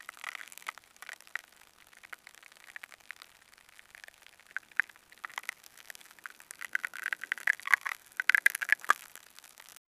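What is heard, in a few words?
roots growing, creaky wood, plants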